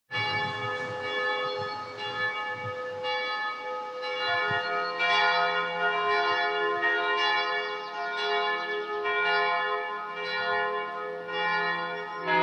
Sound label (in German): kirchenglocken